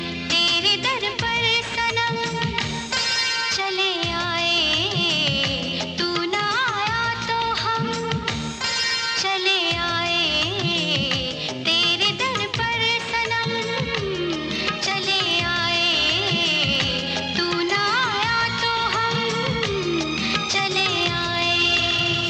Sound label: Female Version Ringtone